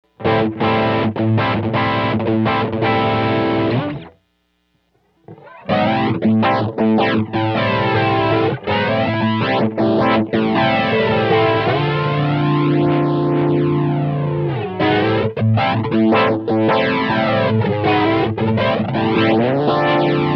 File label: flanger; mobius; zero plus